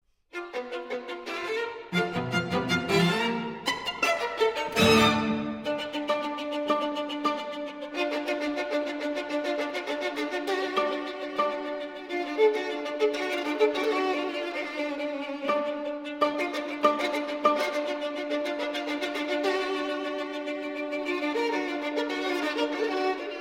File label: String Quartet in A Minor, Allegro molto capriccioso